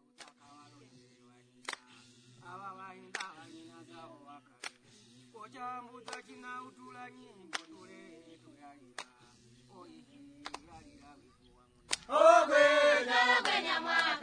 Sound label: wagogo, polyphony